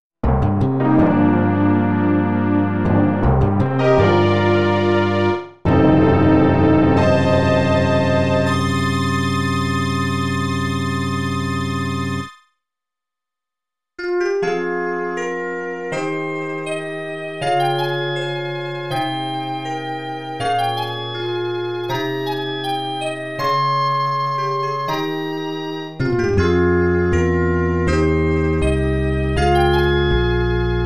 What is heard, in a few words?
ending; derlangrisser